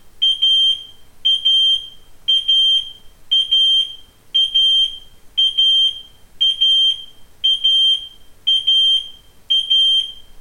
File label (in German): abus rwm250 rauchmelder alarm